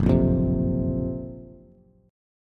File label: Gb Major Sharp 11th, Bass Bass Standard; GbM#11; strummed; JGuitar